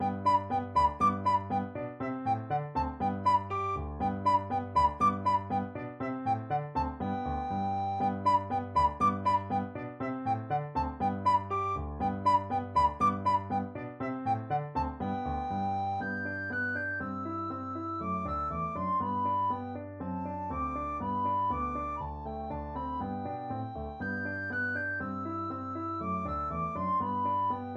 hummel ecossaise recorder

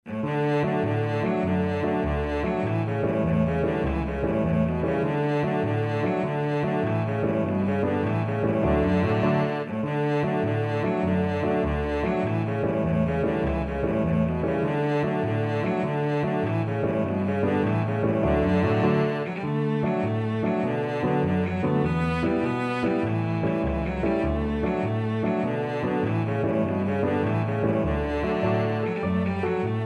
cello

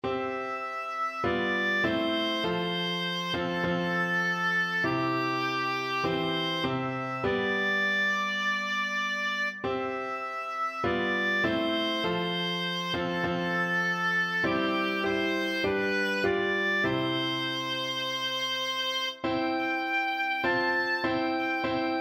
nearer my god, oboe

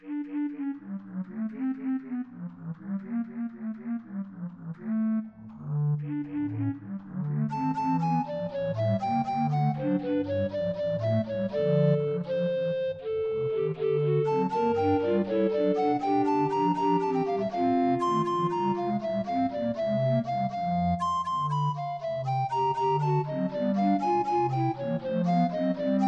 recorder quartet

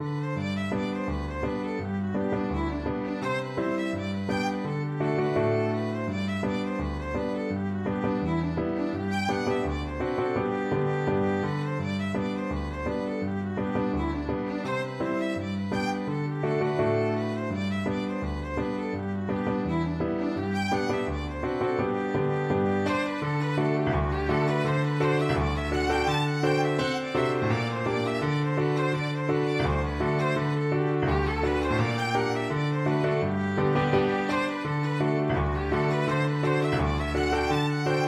violin; autumn woods

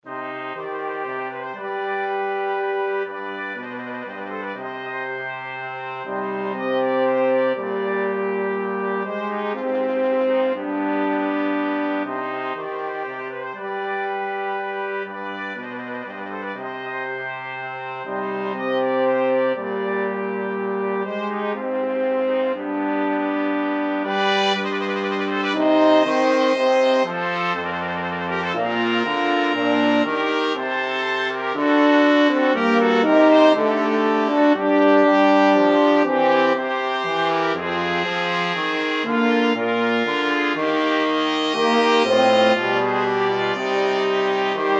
flexible mixed ensemble, 4 players

